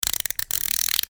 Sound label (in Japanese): ゼンマイ おもちゃ 巻く